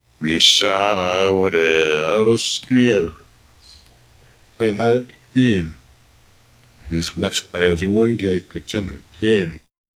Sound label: male